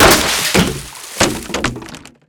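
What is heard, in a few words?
destruction water cooler; Explosions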